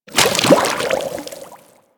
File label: Settlement Oil Bubble Burst; Ambience; Warframe; Grineer Settlement